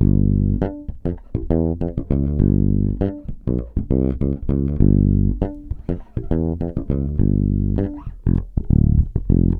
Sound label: SHINN 100 G, Bass Grooves; USB Soundscan vol.30; AKAI